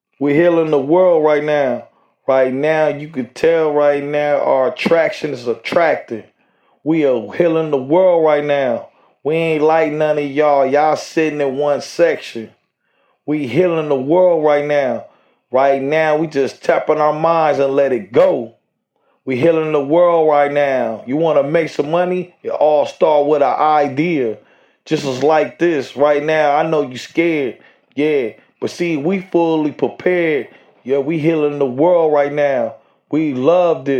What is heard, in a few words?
Acapellas hip hop 155 Ebm; Healing The World